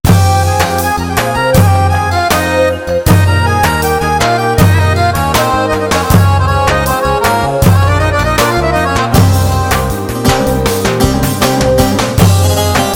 4/4 turku

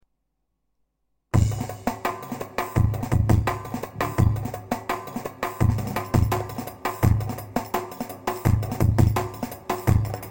cift; tr karisik ritimler

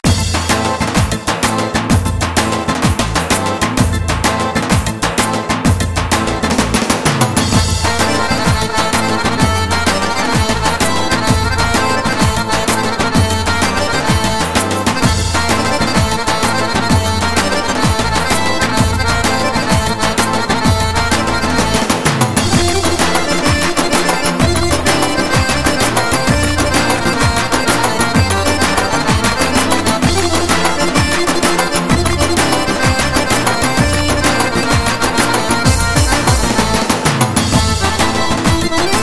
azeri, yeni ritim 6/8

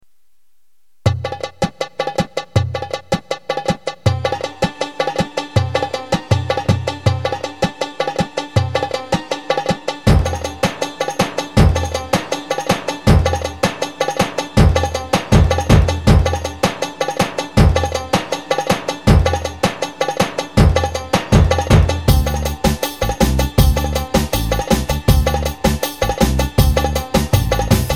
kemalpasa, ritim; sx3000 inegol; yeni ritim demo

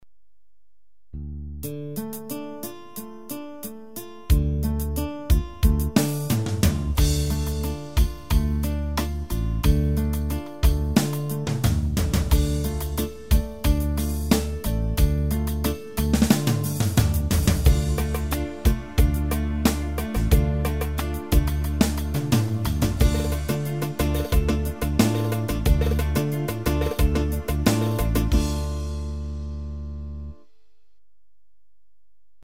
turk slow, ketron

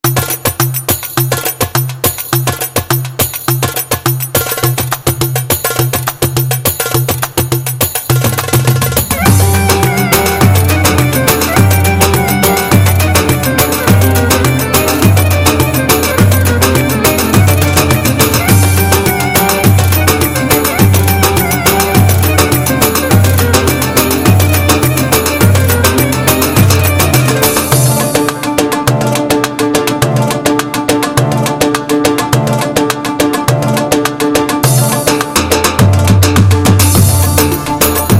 ince saz, bk5; 2-4

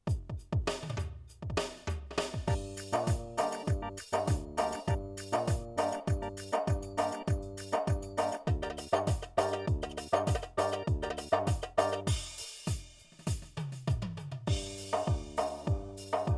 kasik ritim; gem wk8; se turk demolar

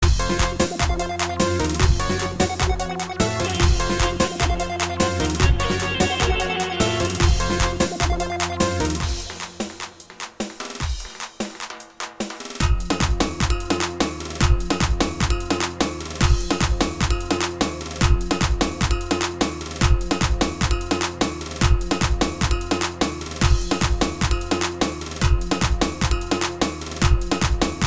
roman, korg pa 500 tr

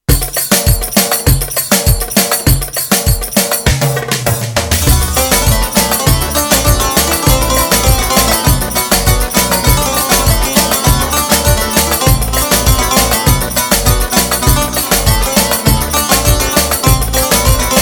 kesik cayir; Roland GW-8; Ankara Oyun Havaları; Çalarken hatalarım var ,hücum kayıt; Trakya Müzik-Türk Piyanist Birliği

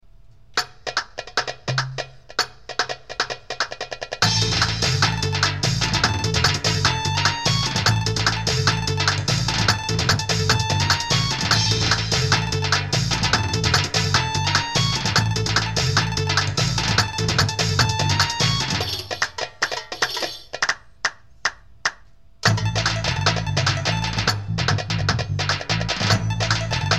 gw8 romdi

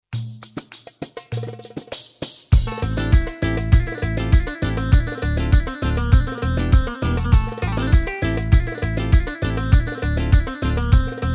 mix oyun, Yamaha PSR A300TR V2

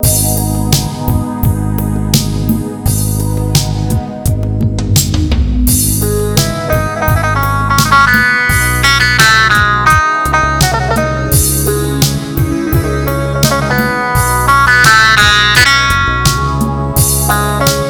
Roland BK-5or elektro; mega

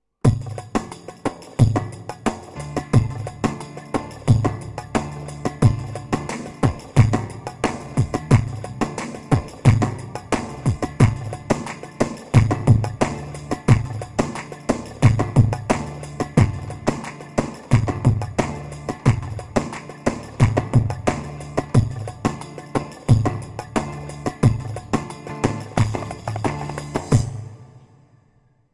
tr ciftetelli 4, korg pa 500; tr karisik ritimler

